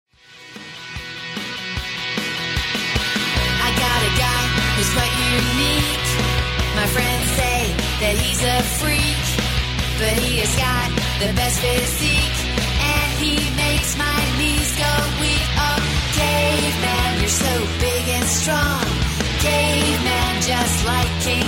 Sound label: singing